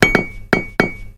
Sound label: anvil impact; stephan schutze